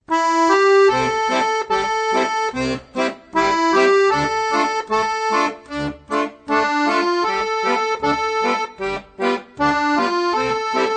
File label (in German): Akkordeon; Auftakt; Mälzels Metronom; Akkordeon Workshop Band 1